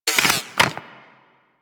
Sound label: SMG Reload, weapons; Protea